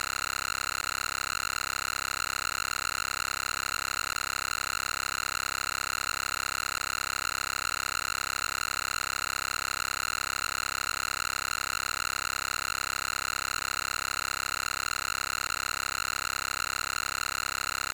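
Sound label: telegraph noise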